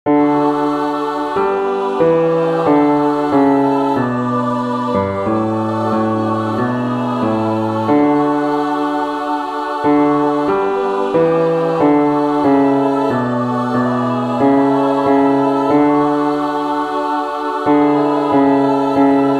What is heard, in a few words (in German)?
Bas Klavier; bass; Crossing the bar; Alfred,Lord Tennyson